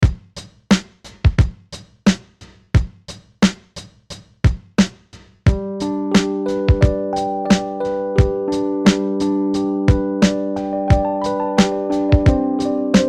BEAT ELECTRIC, Beat Keys Shimmer; Miscellaneous Loops

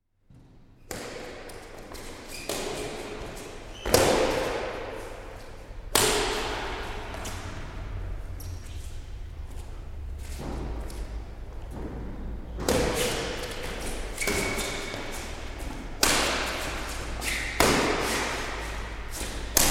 Badminton, amateurs playing indoors; Miscellaneous Sport; BBC

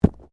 Bodymovement Ground Impact Heavy; Body movement foley to simulate falls and deaths; Wrestling And Struggling Sound Effects

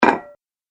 Heavy Pasta Bowl Placed And Resonates; Dishware Sound Effects; Household Sound Effect; Andrew Fleming

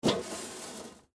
Mail Box Open, Household, Mail, Box, Open, Metal; Miscellaneous Household; BlastWave

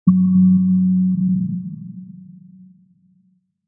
Hnted Gong, Deep and creepy gong sound; Haunted Gong 1; Sound Design

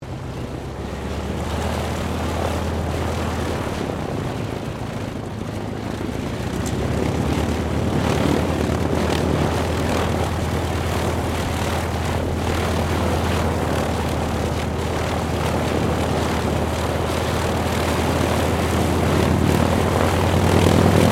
AIRCRAFT CAC WIRRAWAY SHUTDOWN; CA-16 Wirraway; Airplane Sound Effects; Airplanes (Sound Effects:Vehicles)

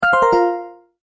Multimedia Tone-Return/Back

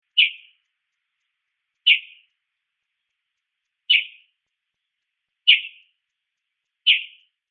Mocking Bird Chirps, A mockingbird chirps.; Birds; Animals

